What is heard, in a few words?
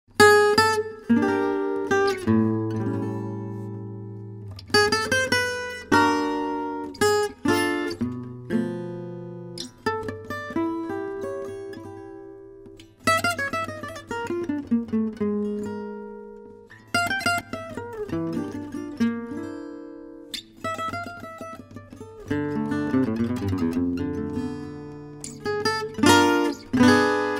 Manouche; LES YEUX NOIRS - Variation - Dm; MyVirtualBand